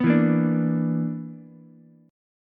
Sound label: B-Minor Major 7th Double Flat 5th B down Ukulele; BmM7bb5 strummed; JGuitar